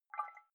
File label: dabble glas bowl slow; industrie wirtschaft; gastronomie